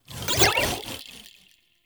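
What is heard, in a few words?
Power Shroom Projectile Whiz By; Warframe; Nokko; Empowering Mushroom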